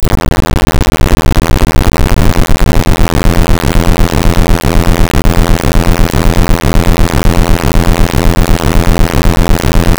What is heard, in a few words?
low frequency pulse whoom